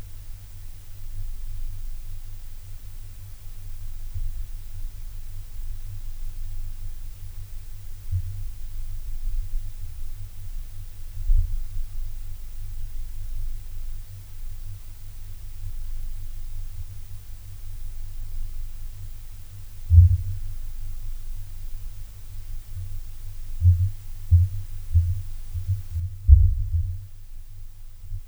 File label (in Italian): Passi